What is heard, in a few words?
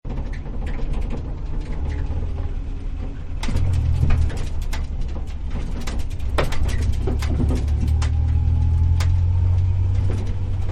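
Tuk Tuk Very Bumpy Ride Interior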